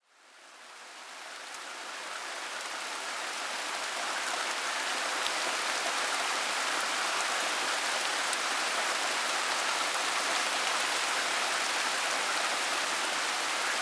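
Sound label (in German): Regengeräusche Entspannungsmusik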